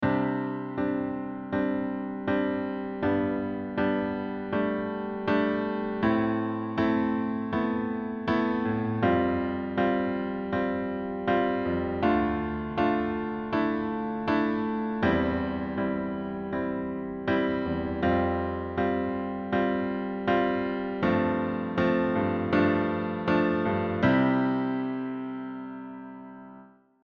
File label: diatonic sus2 4